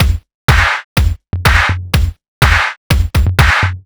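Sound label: 124 Wubblefunk Bass Drum Snare, Drum Loops; 124bpm; Nu Dance Electro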